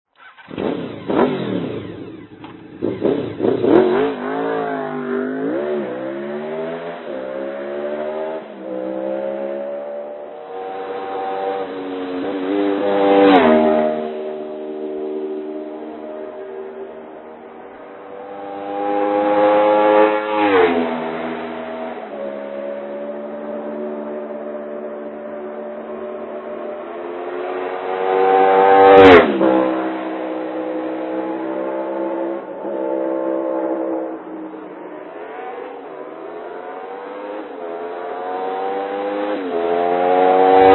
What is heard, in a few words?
honda 750 vfr ext; GSX-R